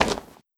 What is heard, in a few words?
Barbarian Cloth